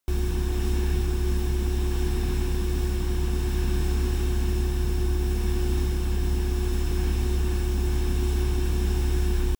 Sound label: military jet a-10 thunderbolt turbine idle direct